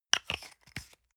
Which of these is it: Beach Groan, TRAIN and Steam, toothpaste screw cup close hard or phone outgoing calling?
toothpaste screw cup close hard